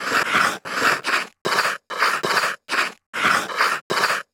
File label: blade, iceskate